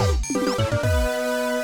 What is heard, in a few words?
item fanfare; Tokyo Wakusei Planetokio